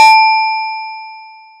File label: bell rings